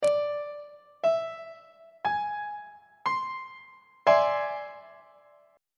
e augmented 7th 3rd inversion on treble clef

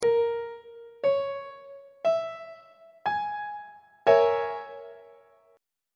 d flat minor 6th 3rd inversion on treble clef

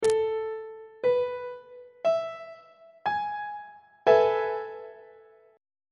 a major 7th suspended 2nd chord on treble clef

